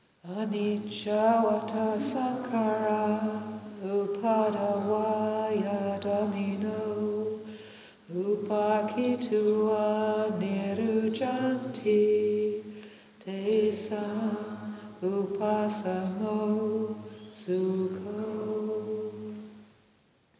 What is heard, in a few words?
Annicca Chant Pali